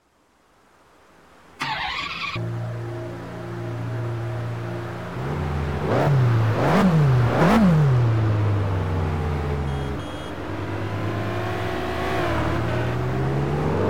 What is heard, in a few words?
ferrari f430; TDU-1